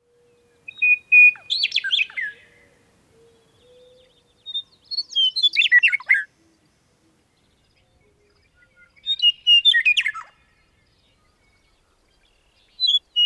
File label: meadowlark western